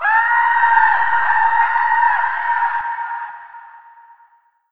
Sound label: child scream